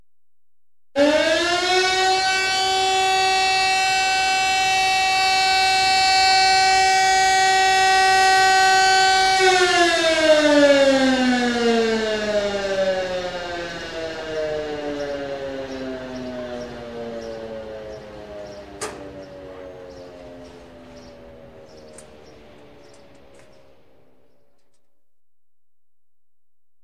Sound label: Siren